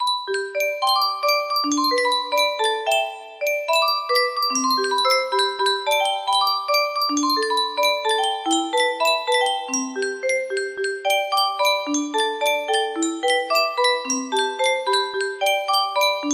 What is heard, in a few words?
chorus, music box